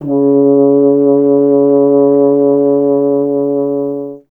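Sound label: BRS F.HRN, Solo Instruments, French Horn; Miroslav Vitous; Solo Instruments VOL-2; Solo lnst. II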